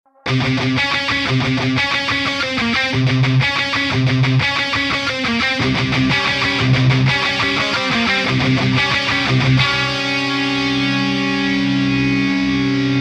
guitar

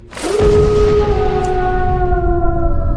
werewolf howl; Animals

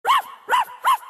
dog voice; Animals

